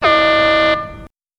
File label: scifi siren; sirens